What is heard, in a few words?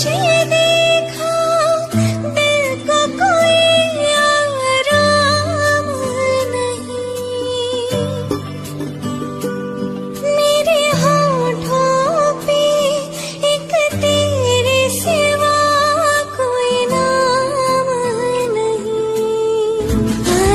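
romantic bollywood indian ringtone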